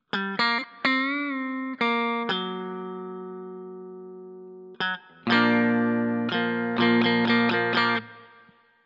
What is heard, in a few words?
bridge neck